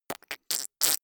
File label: fake fart